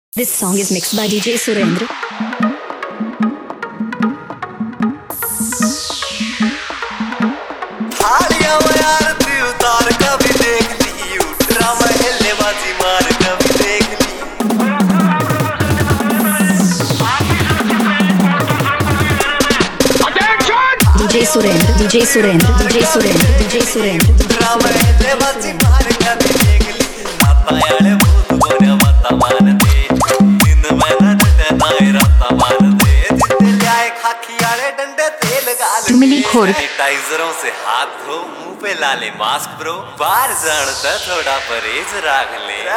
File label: Remix New Haryanvi Dj Song